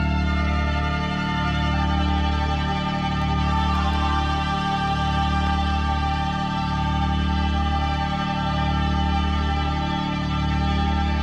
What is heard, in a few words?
Spanish Guitar Folk